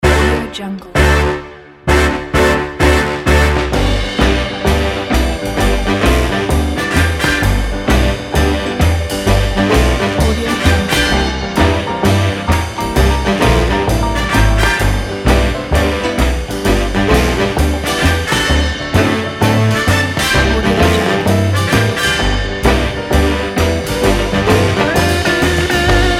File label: vintage new oleans rhythm and blues